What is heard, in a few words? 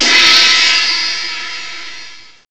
DARK crash; haunted; jazz jackrabbit 2